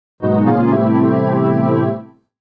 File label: DEEPHOUSE SYNTH 125 A; Keys